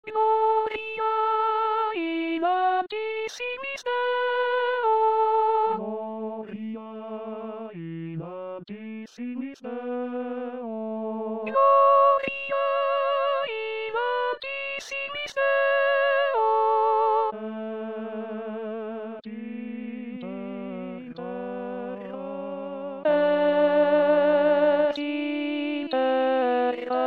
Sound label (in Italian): contralto; oratorio de noel